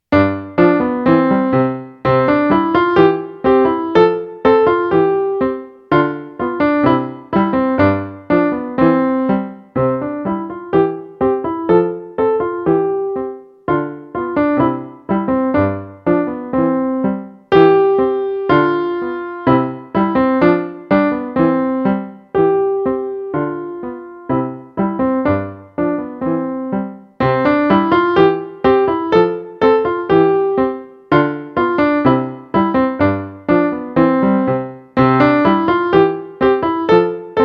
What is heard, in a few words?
Burleske, Soprano Recorder; Guderian - Playing the Soprano Recorder; L. Mozart